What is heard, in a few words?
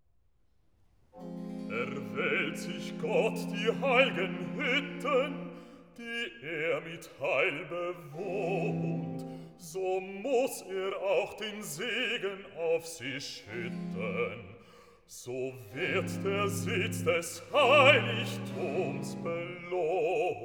Cantata, Recitativo (Bass); BWV 34 'O ewiges Feuer, o Ursprung der Liebe'; Bach; Long Melford; Whit Sun